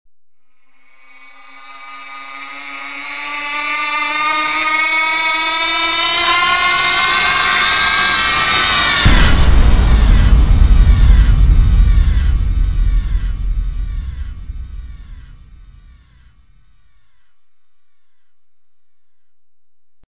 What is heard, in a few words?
Stinger; STINGERS